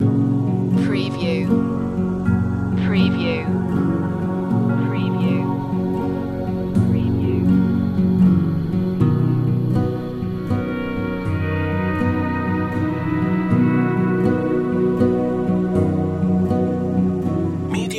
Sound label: drifting away